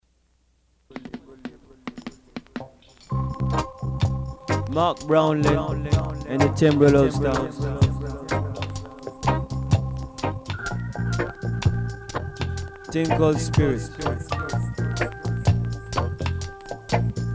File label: reggae vibes